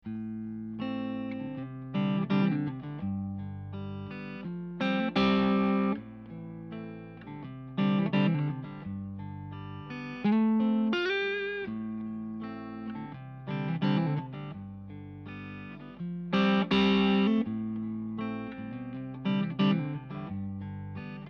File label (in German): orange halbe zerre strat h